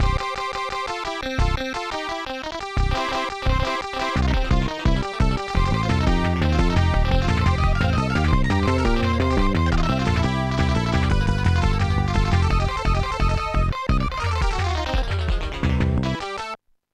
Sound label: rock all night; Instant Music